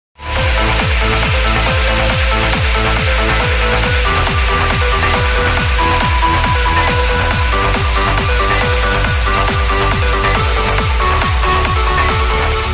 distortion ringtone

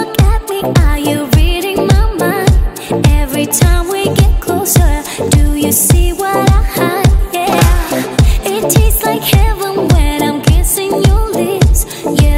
dj mix; hollywood ringtone; english ringtones

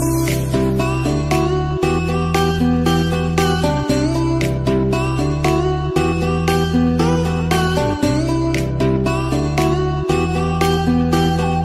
hollywood music ringtone; english ringtones

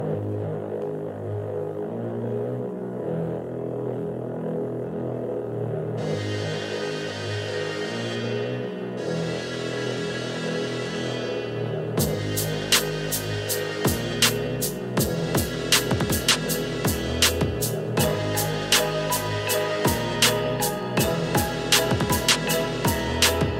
bollywood song ringtone

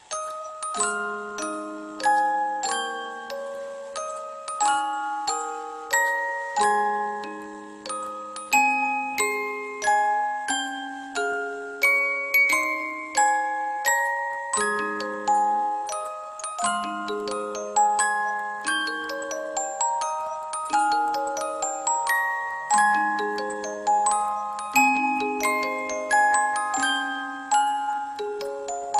happy birthday ringtone

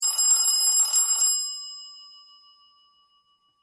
ring classic ringtone, sms

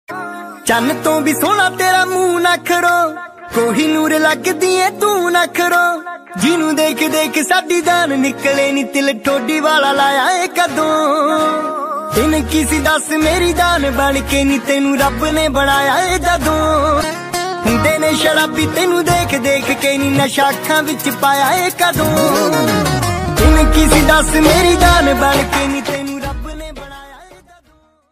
punjabi ring; bollywood

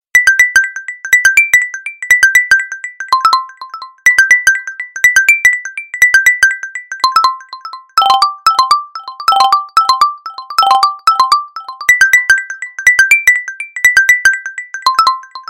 moto g5 plus ringtone

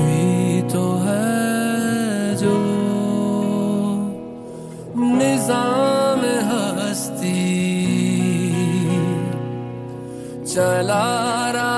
ringtone, bollywood ringtones

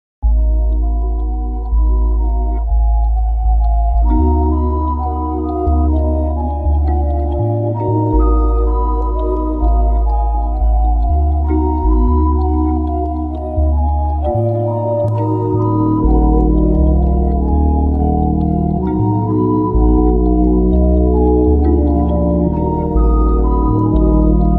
best instrumental music english ringtone

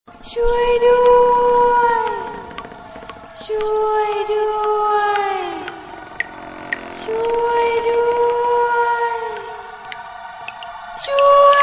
horror girl ringtone

